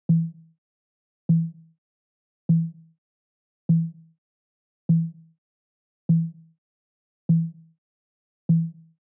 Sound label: Drum Loop 100 BPM Tom, Afrobeats; Loop Stems; Cymatics; PARADOX II - Drum Loop Collection; Duppy Drum Loop